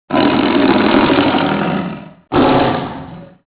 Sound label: tiger; zvierata; divoke; zem